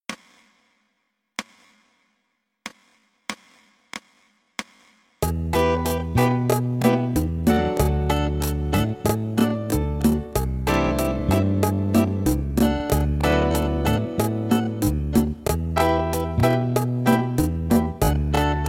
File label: Bachata in G slow